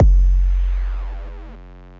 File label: Kick Body Byte; Percussive; Ableton; Factory Packs; Punch and Tilt